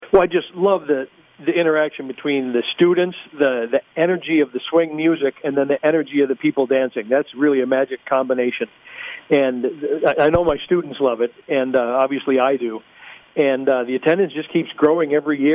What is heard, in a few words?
Jazz Swing Party